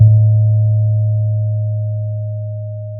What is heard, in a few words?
pop, Sound of a church bell